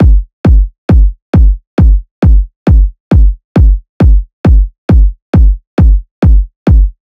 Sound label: Kick Loop 135, Techno Drums